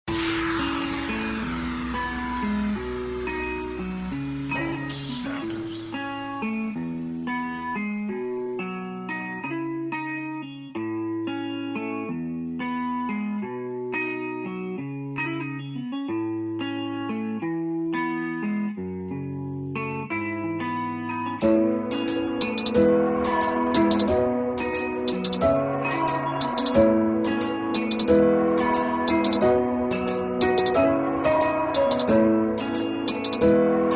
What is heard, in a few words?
Radio Pop Charts; Fox Samples